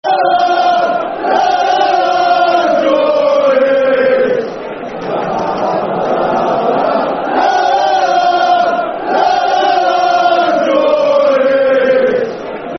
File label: La La La Geordies, Football Chant (Soccer Song); Newcastle United Nufc